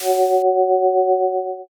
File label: shield up